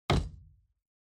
Sellotape Put Down

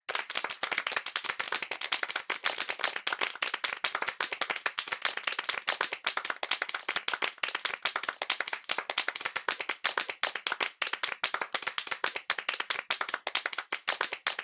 Applause Long

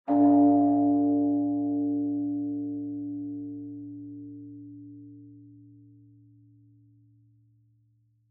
Bonsho Far Low

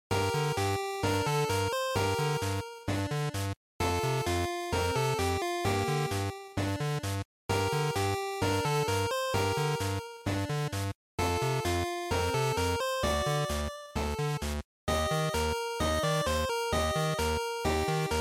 RPG Field, bgm

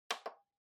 Portable Stereo Button

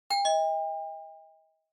Quiz Button Slow

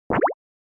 Motion Pop

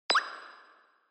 Onoma Button Click High Reverb

